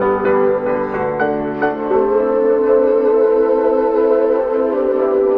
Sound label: piano and voice, Sad; loops